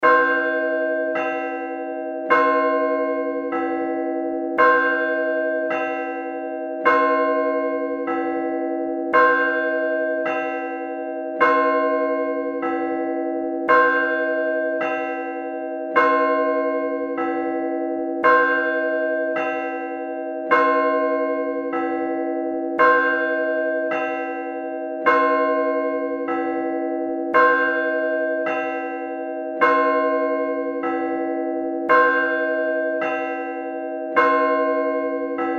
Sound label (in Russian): zvon v dvoi